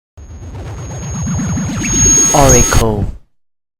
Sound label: ORACLE SOUND LOGO